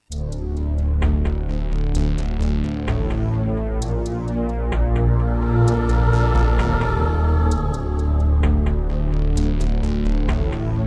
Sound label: Terratec MiniWaveSystem SOWT-11; Descent II